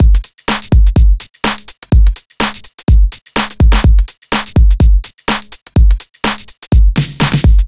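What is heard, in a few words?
Steppin Beat F, drum samples dance electro; drum сэмплы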